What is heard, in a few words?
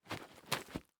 Foley Footstep Hard Sole Shuffle On Dirt; Foley Footsteps